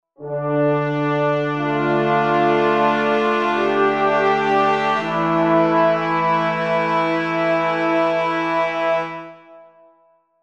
brass; ik multimedia

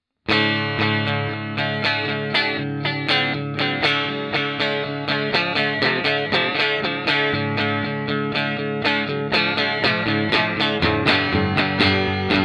rock reverb; tech21

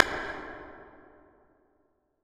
much damping dull, reverb; anderton

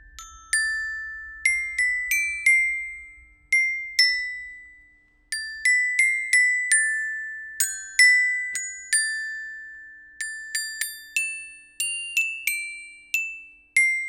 xilo droit; pendulum; quartet

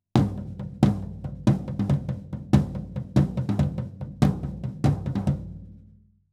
drums stereo toms; akg 214

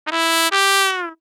half valve falls, trumpet; samplemodeling

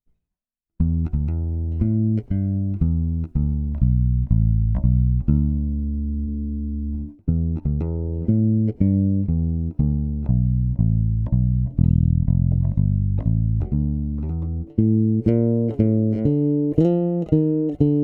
bass comp eq; lexicon; ionix fw810

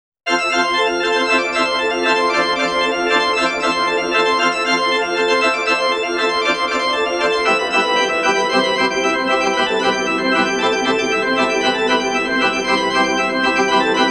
toccata; quikquak; rayspace

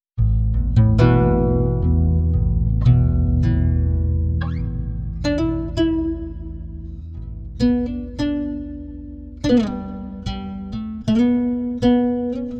12, pitchshift, guitar

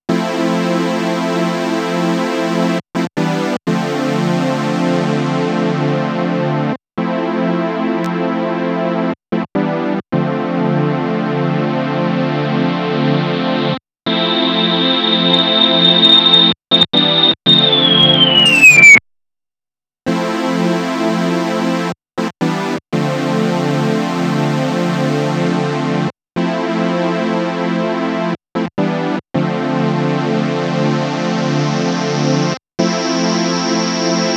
Waldorf Blofeld hires manual lpf; lpf 24 ppg 12

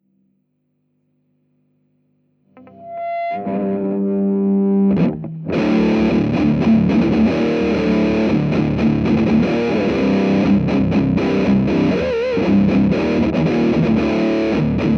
rectified; randall; re20; mts

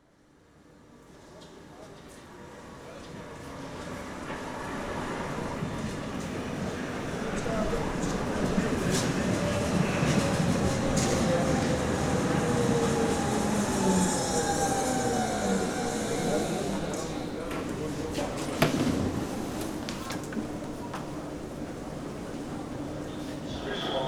underground; olympus ls-10

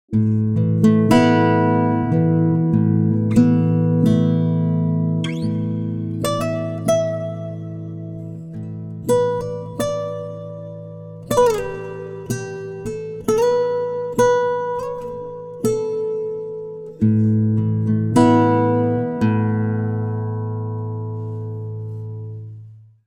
133, timestretch, guitar; prosoniq time factory mpex3

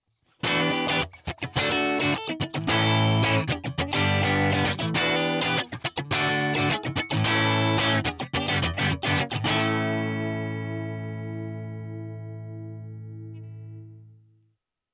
lead; line6; pocketpod

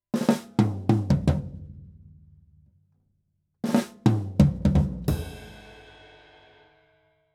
drums stereo break; akg 214

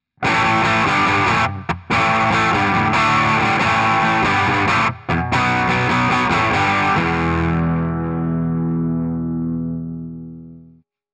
tonezone crunch, fender hotrod57

